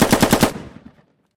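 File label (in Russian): длинная оглушительная пулеметная очередь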